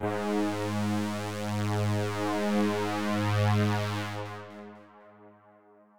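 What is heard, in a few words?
analog G#3; analog server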